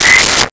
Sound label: Walkie Talkie Feedback; Communications